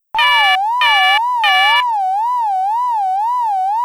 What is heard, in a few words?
lakitu pickup; tf2ware ultimate; mariokart